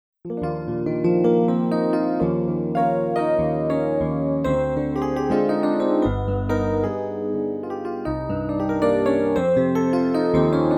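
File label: digital electric piano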